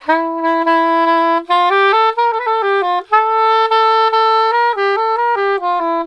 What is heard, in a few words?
SAX, Balkanish Traditions; USB Soundscan vol.63; AKAI